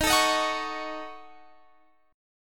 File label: Eb Minor 7th Flat 5th Eb down Guitar Standard; Ebm7b5; strummed; JGuitar